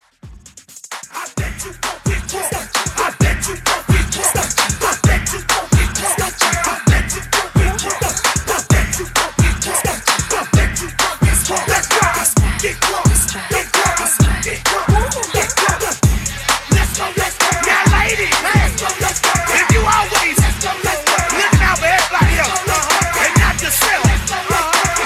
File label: Pop RB 2000s Re Hype Dance Intro Outro 131 BPM